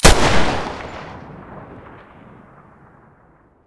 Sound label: muzzle deagle stereo